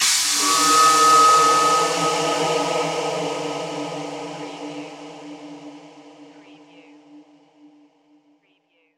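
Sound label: Production Element Hit Cymbal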